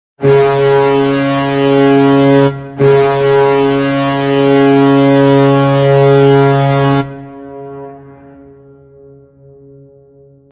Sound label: goal horn; leafs